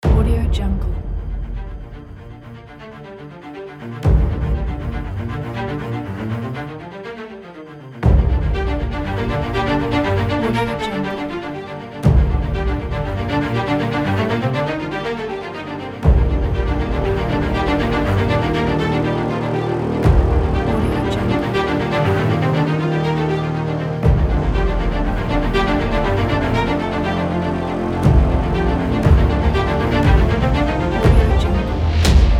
cinematic inspirational drama

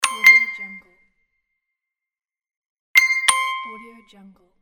opening and closing the music box

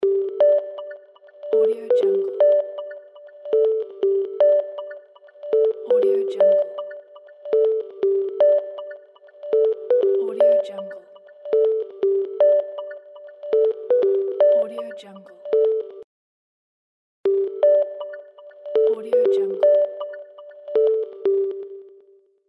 incoming call; absinthemedia

